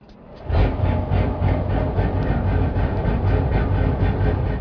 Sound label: nautical